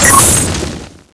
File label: red transform; cso; trinity